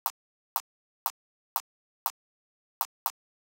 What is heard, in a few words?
Click; hydrogen